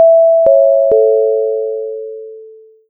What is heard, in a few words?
random chime